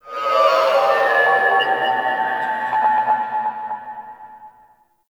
Ship Exterior Satellites; Warframe; Corpus Ship Remaster

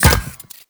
Moa Step; Characters; Warframe; The New War; Veso

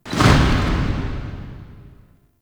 Interior Orbital Station March; Warframe; Tau

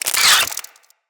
Infested Ashen Spike Cast

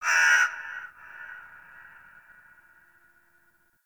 Forest Creature Vulture, Ambience; Warframe; Grineer

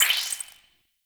Defense Activate Whoosh; Gemini; Warframe; Entrati

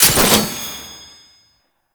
Rocket Launcher Mech; Warframe; Weapons; Tenno; Lasrian

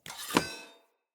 Cleansed Spear Equip; Weapons; Player

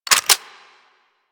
Hand Shotgun Reload; Warframe; Weapons; Corpus

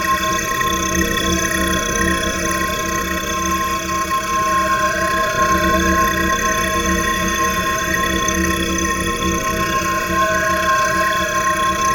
Prism Loop; Warframe; Warframes; Harlequin

